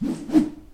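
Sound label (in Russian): свистящий звук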